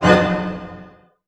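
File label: D# diminished pianissimo, orchestra hits; Sound On Sound - Peter Siedleczek's Orchestra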